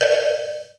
monk; EnvSound

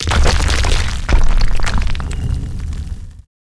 die; 석벽

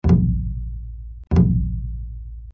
drum

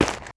Normal Step Left, Movement

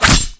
MOB BATTLE CLAW, Battle Mob Weapon

